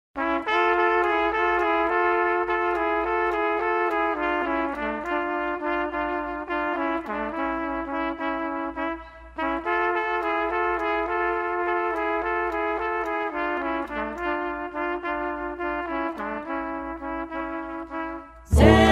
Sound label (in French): Rumelaj - Bulgarie